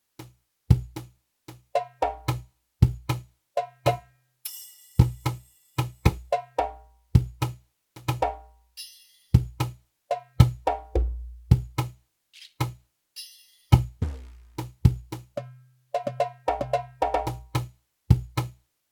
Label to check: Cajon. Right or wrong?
Right